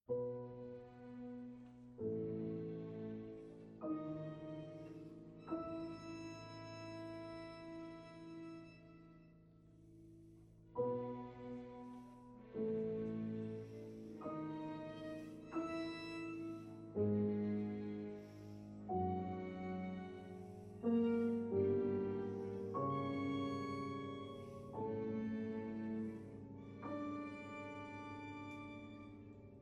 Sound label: Piano Quartet, Adagio; Allegro